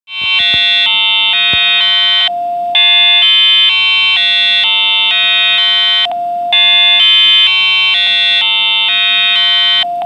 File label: DAC Output